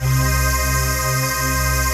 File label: HOUS PAD, House Dance; 90 s Sample CDs; Best Service Dance Mega